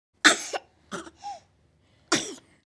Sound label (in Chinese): 婴儿 咿呀学语